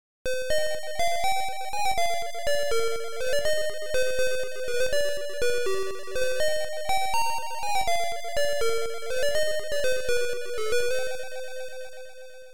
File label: Frogammy